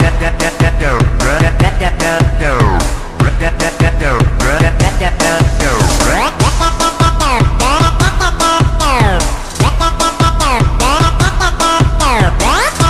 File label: metal